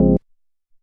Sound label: Tek Hit